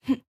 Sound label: Punch; Going Under; Jackie